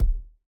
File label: Ball Player Hand Hit Tackleball Extra Light